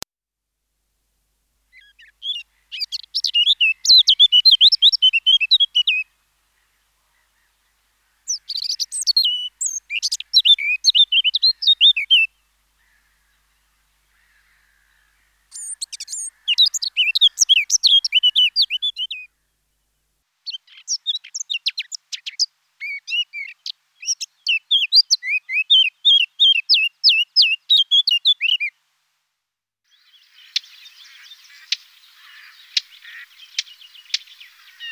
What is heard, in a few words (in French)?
fauvette a tete noire, oiseaux